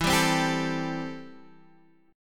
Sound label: A-Minor 7th E